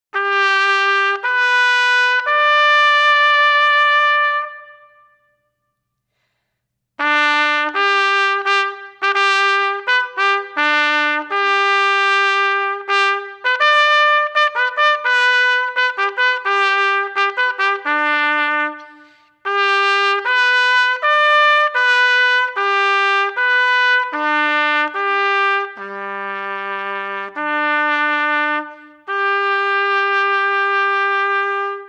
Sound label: call to quarters; Ceremonial; Marine Corps Birthday Ball